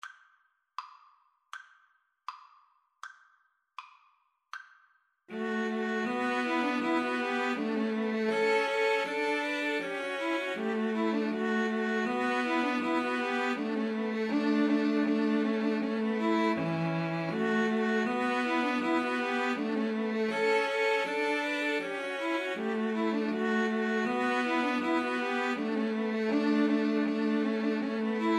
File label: Bach Gavotte Cello Suite 6, String trio